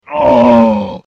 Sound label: pain, troll